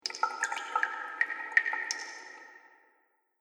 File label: Water Fantasy Cave Drips